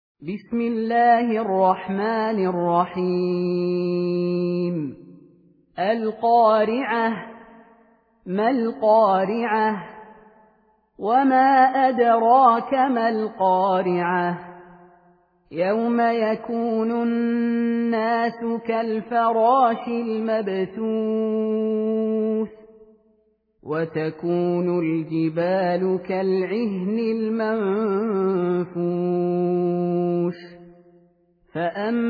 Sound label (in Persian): سوره قارعه; خالد المهنا